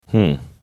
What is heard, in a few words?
hmm